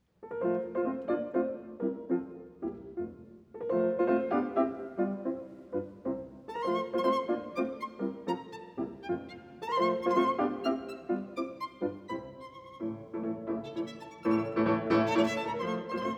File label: Sonata for violin & piano No. 5 in F major, Scherzo. Allegro molto; Beethoven; ＂Spring＂